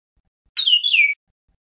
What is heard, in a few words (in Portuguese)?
canto bicudo